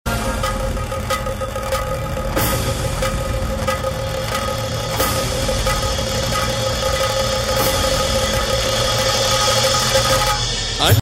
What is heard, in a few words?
om shiva om, shiva ringtone; Navratri Special